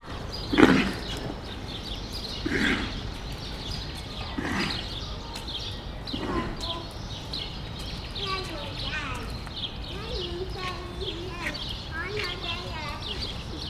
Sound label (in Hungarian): balról jobbra, láma; debreceni zoo 2012